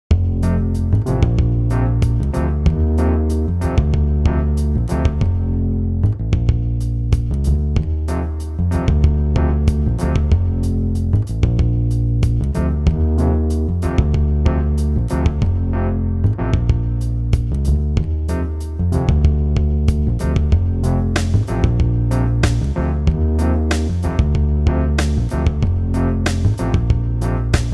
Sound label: Beat; Peggle Beat 02